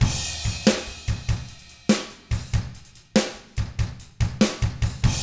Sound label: rock 6/8, drums; ILIO; Double Platinum Drums 1